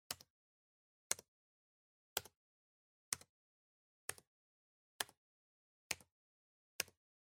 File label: PC Keyboard Single Mid